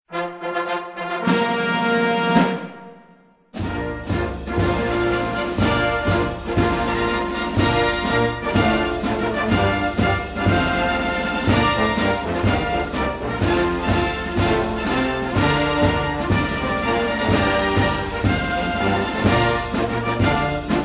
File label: Ruffles and Flourishes General's March 3 star; Ceremony Music